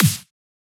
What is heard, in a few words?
SnareClap; FLPRO